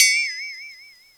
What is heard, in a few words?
FLEXTONE; Ragga & Dub Reggae; PERCS KIT; AKAI; USB Soundscan vol.27